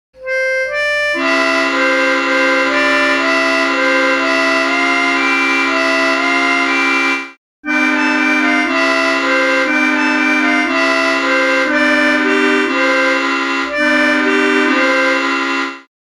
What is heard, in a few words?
Frere Jacques, Reeds; BT tuned Magnus chords